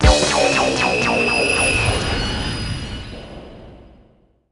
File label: Defeat; Advanced Circuits; DSiWare; NDS